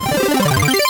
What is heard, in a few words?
you win; tetri5